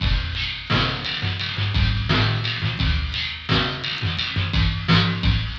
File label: Flange FX, Loops, breaks; M8